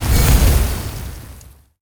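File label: Pillar green death, monster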